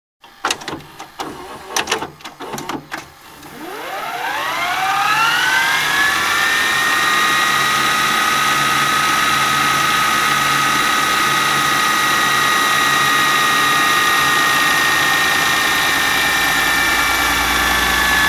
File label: Video Cassette Recorder; Mechanical whirr and clunking of a Video cassette being inserted and ejected.; Tape Players